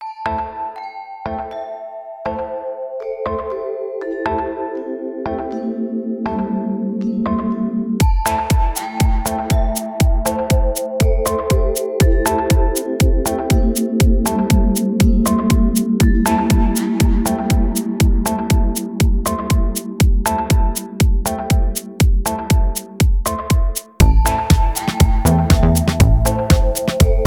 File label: Future Chill House